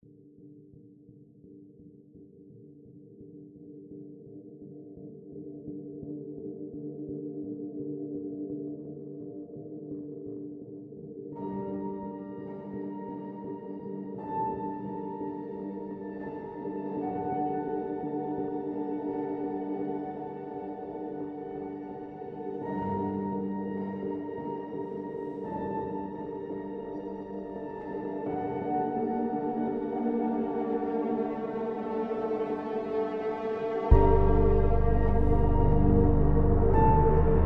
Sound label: tension